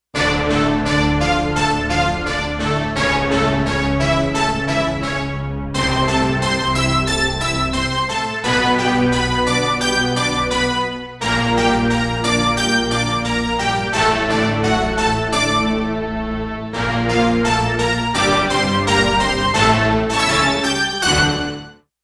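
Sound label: Staccato Orchestra; Digital Synthesizer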